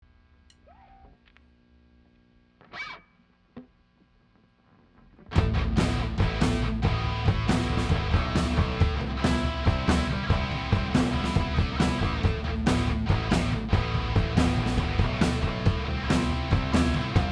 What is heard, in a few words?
Drum Stems